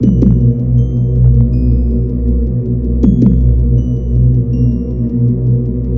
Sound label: horror